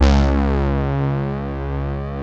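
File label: OBX PAD C5, Oberheim; USB Soundscan vol.49 - Analog Fantasies; AKAI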